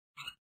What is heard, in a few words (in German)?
Wasserhahn Sound; The Dream Machine